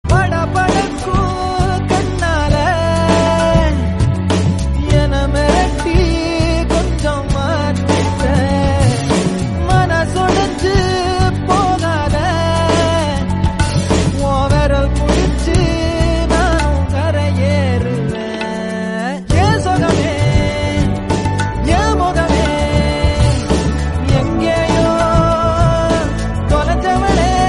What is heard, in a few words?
Tamil Song; Manithan